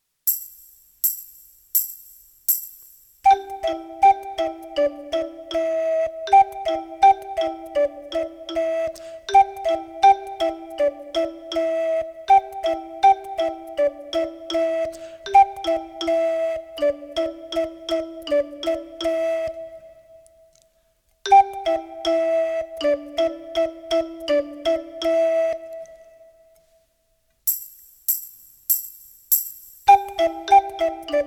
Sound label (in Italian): ritmo; musica in classe